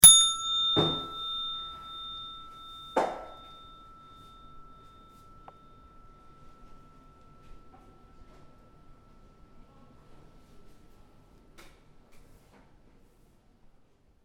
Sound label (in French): Sonnette Pipi